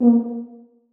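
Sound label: tuba staccato a#2; Sonatina Symphonic Orchestra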